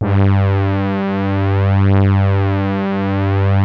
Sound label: JX STAB G2, HARD BRASS; Music Store - Claudius Diemeter Schwerlos